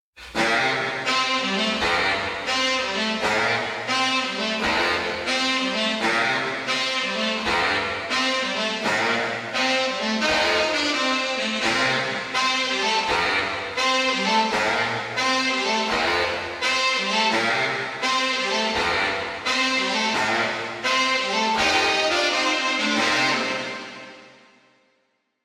sax wet

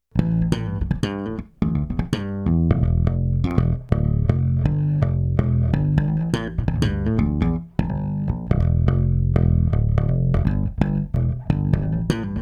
Groove Bass Nice Place

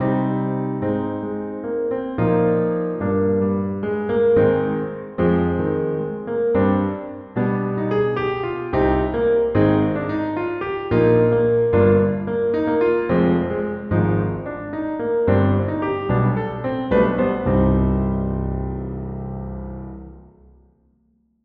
piano mix

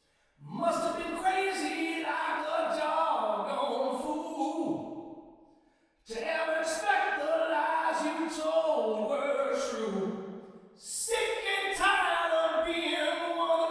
male vox wet

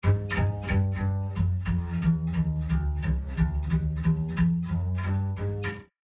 bass wet

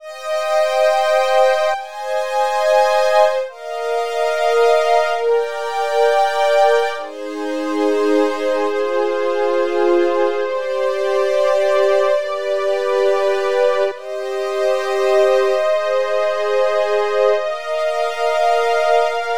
synth dry

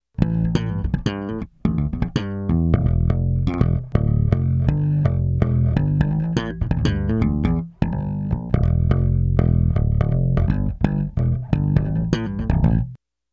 bass dry

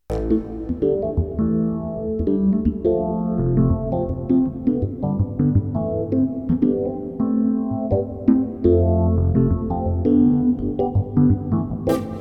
Groove Synth Cathedral

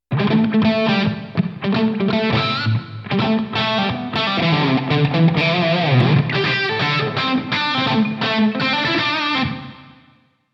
taichi e guitar

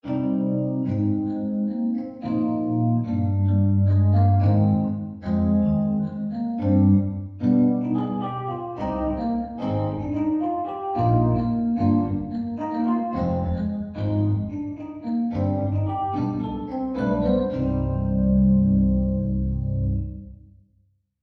vintage key wet

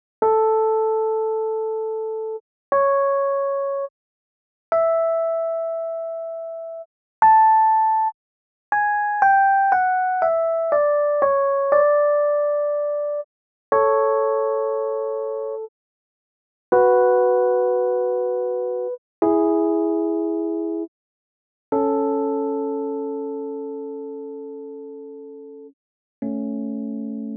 epiano dry

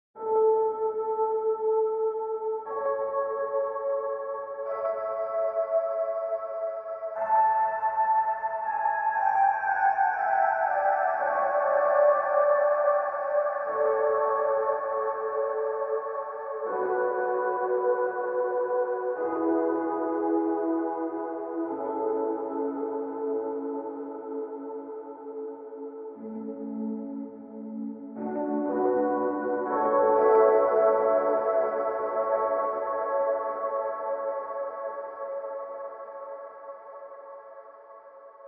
e piano wet

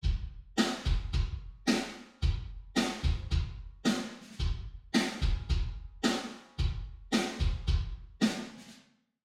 kick snare wet

